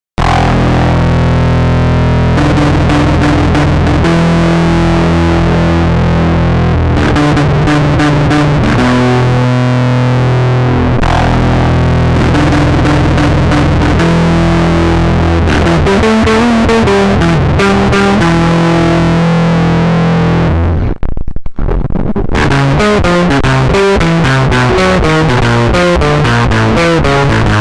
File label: Led Red Scream, Over Drive; シンプルなOver Driveの製作